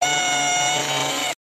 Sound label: Small Electrical Motor